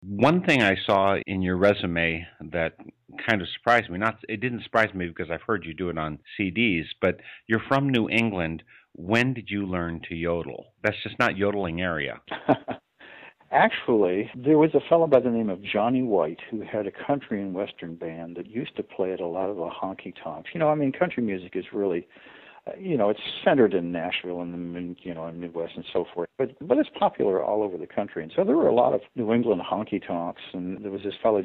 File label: yodel